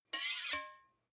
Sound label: two knives large scrape short